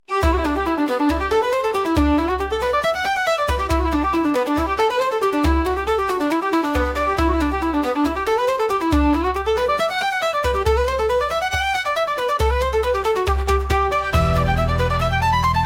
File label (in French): Instru fête; la fete au village